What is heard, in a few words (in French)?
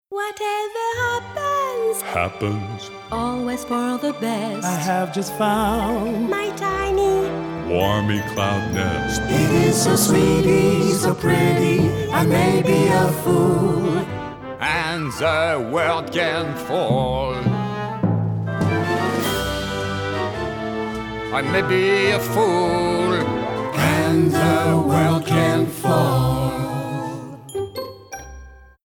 voix chantees, publicite; Boursin